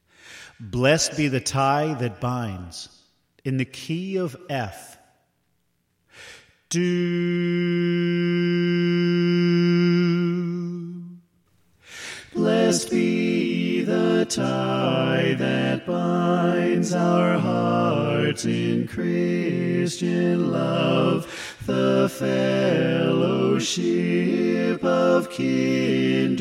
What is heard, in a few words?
Soprano Left; Blest Be The Tie That Binds